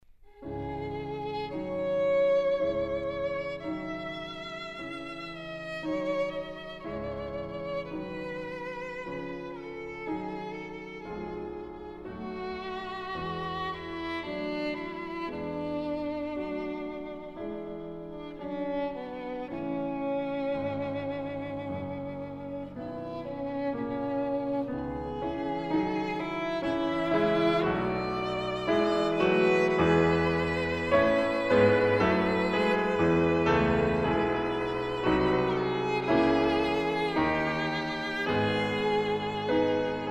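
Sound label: violin sonata; nielsen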